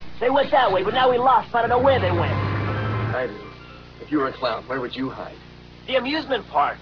amusement park

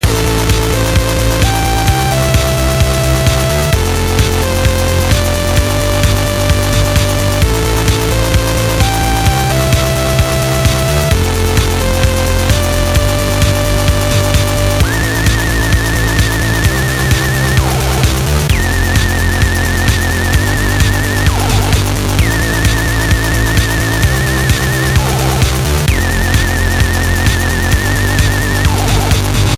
Techno

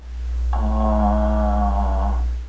ahh

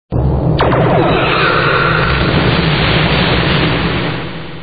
photon torpedo

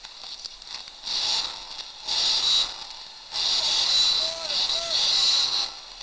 typer

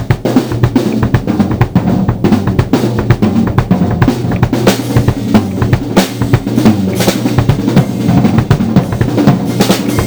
jerry solo, drums

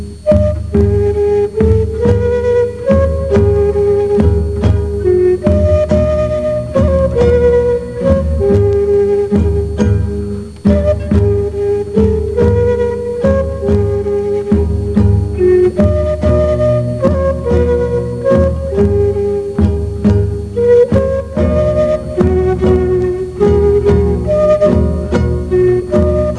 flute

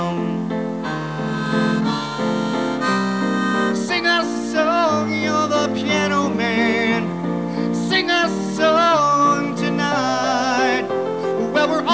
piano

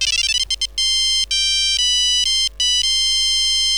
win

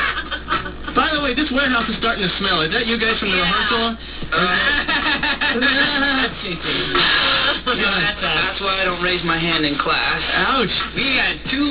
raise hand in class

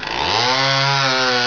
saw up; Doom ''Univers Paralleles'' (Sybex) French; DOOM2

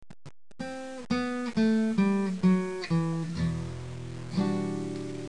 riff

